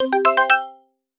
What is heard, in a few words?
app alert tone ringtone